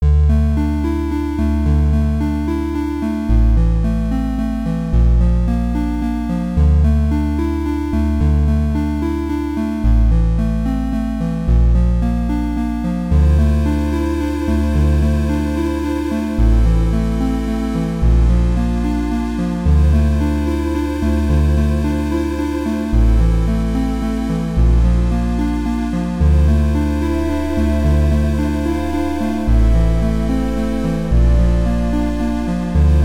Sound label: Calm Streams